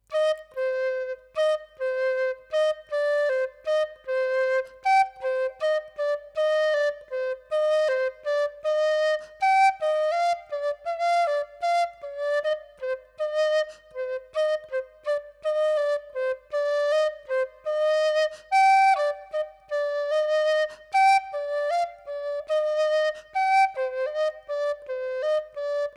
whistle B rosewood

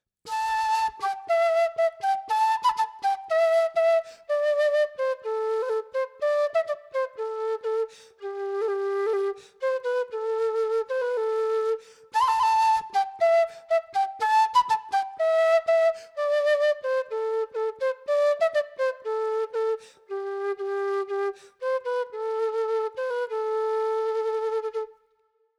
quena

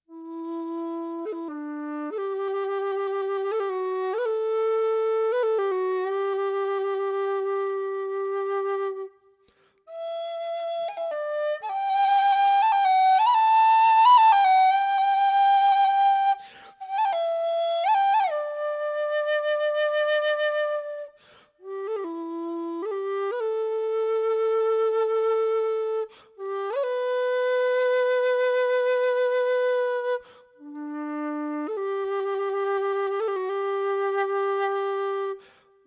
whistle D low

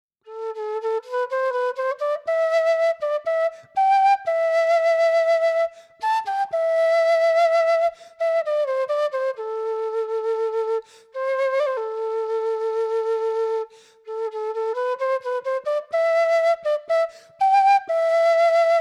quena rosewood; G

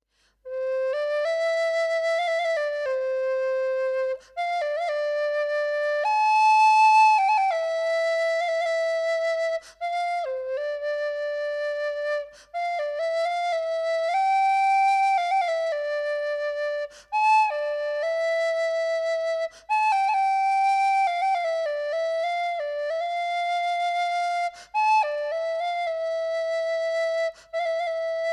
whistle C rosewood

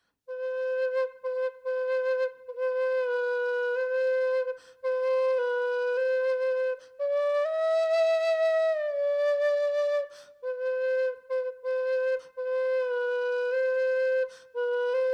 whistle G rosewood

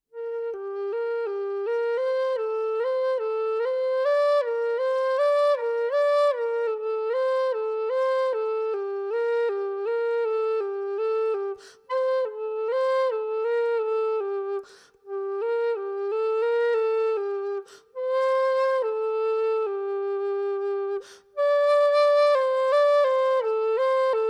whistle

